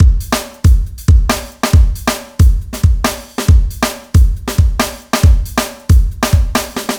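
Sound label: funky 126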